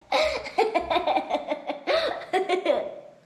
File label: Child S Laugh